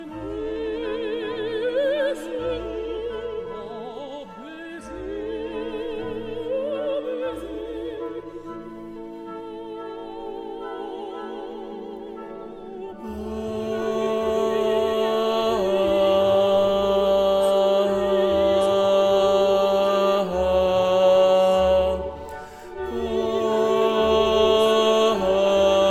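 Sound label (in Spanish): Barcarola tenores, opera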